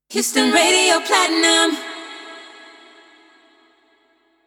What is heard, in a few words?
Radio Jingle E Key 165BPM Acapella; Houston Radio Platinum